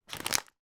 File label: food crisp potato chip packet light short movement